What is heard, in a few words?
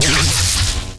phasemissile; Tanks